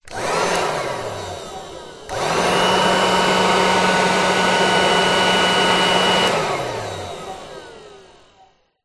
industrial leaf blower electric on run off short

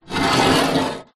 foley metal rod drag scrape on rusty metal table; zapsplat

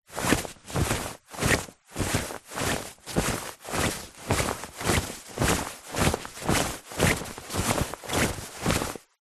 clothes shorts walk movements slow; foley

